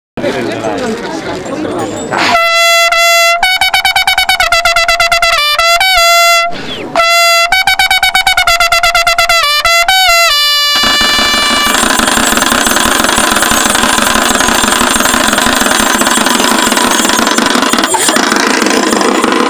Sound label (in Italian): Tromba; TrombaCalvario